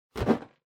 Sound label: Balloon Noise